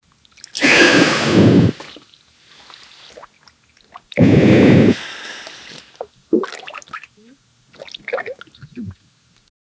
young man vomit water whi

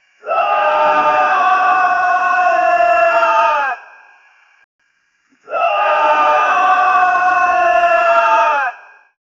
a cacophony of overlappin; distressing

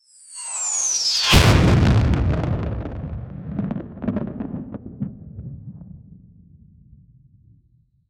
anime style lightning bul; electricity

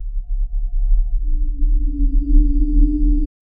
in a fantasy game players, potion

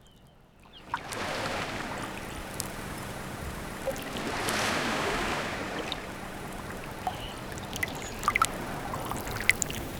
gentle ocean waves water

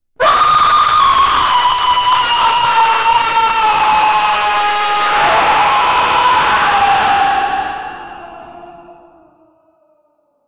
human like monster scream

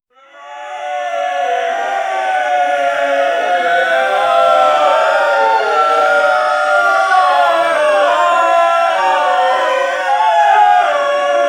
bit pixel style of

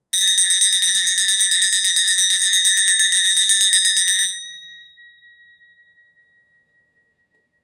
puja with bell and dhak; ceremonial

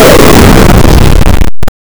player death digital

